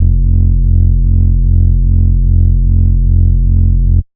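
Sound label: bass sustain rumbler F; Polyend; ARC Noise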